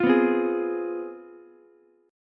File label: C Major 7th Suspended 4th Sharp 5th, down, Ukulele; CM7sus4#5 strummed; JGuitar